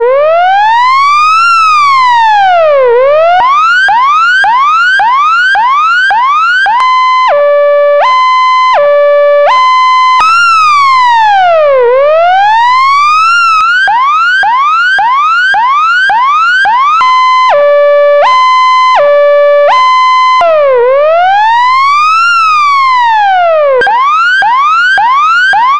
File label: emergency electronic siren